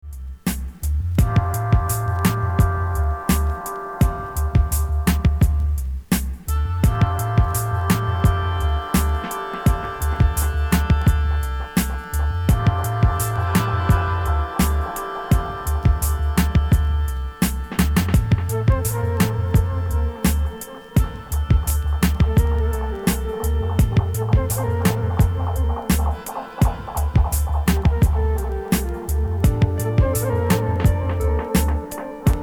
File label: dub